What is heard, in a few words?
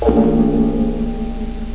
New Mail